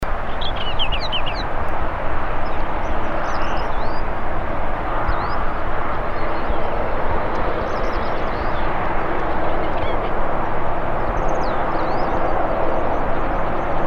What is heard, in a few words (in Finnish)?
toinen koiras laulu